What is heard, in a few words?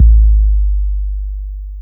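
bass drum FX, dance drums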